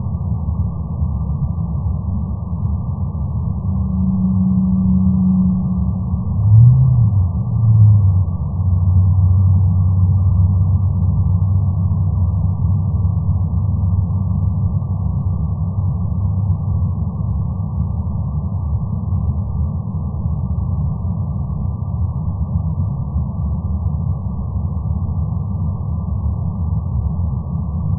long moan bp60-160Hz

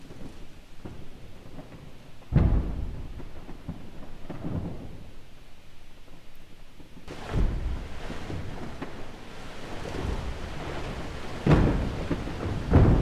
Ice Calving ice calving

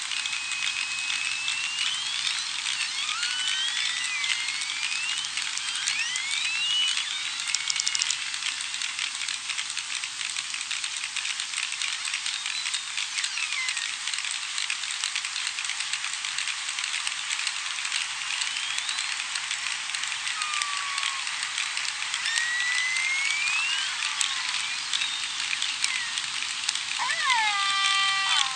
Multisound pilot whale

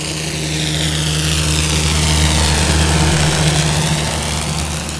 vessel

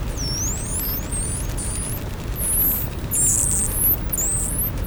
Multisound atlantic spotted dolphin

